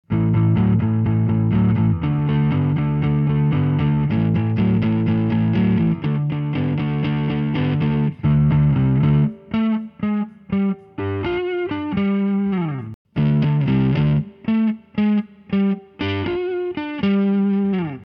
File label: sm57 e609 compare eminence